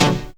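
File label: piano chord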